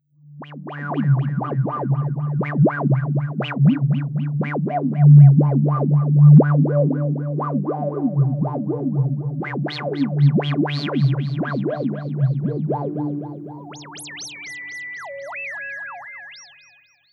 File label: resonant drone